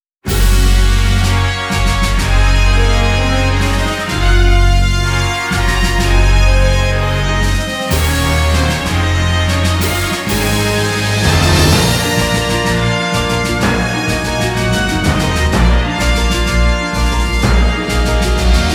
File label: Fanfare - St. Moritz Racecourse